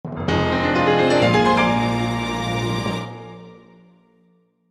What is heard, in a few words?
fanfare heart container; botw